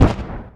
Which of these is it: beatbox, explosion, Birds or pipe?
explosion